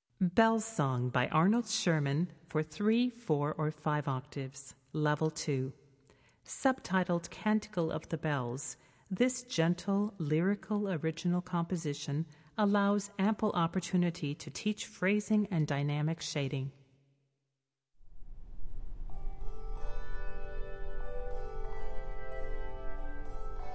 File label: Handbell Music, Handbells Solo; Jeffers Handbell Supply